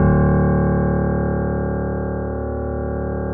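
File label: grand piano D 0; USB Soundscan; AKAI; Keyboards Acoustic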